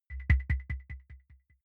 button echo beep bass; maschinen; signale

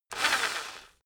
rock drill rev low short; maschinen; bohrer

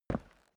step boots concrete single right; menschen; schritte

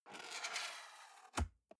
cardboard box open unfold scrape single; objekte

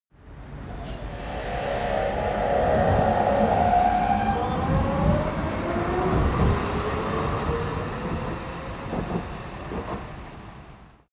train subway old depart; bahnverkehr u bahn

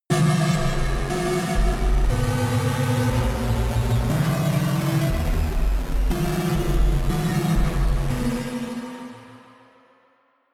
danger synth, sciene-fiction

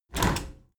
window handle squeak turn open halfway heavy; objekte; fenster

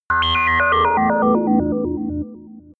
sci-fi dark planet, digital

